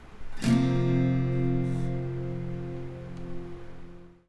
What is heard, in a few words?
Fsus4, guitar